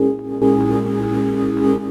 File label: Chords Tones; Equipped Music; Loungin House